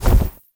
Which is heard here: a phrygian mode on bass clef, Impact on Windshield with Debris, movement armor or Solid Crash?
movement armor